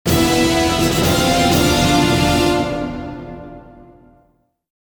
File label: Mission Failed; ratchet clank full frontal assault qforce